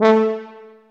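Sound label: brass tuba C, staccato; Solo Brass; Roland LCDP12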